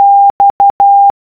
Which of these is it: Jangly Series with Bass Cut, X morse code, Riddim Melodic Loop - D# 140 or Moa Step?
X morse code